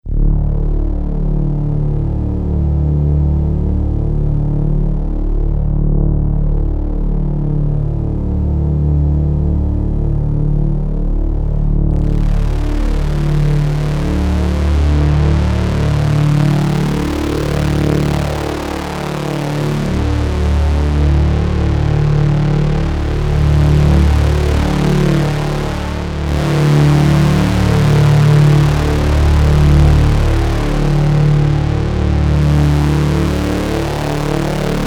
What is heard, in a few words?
Dramadrone; Best Of N2O Library; Drones & Foundations